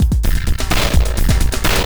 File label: Processed Beat, Drums & Percussion